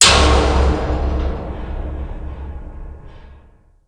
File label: metal hit; ambient; metals; DoomRTX